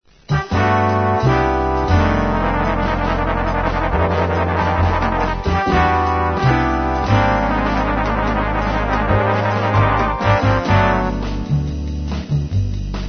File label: trombones